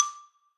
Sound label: xylo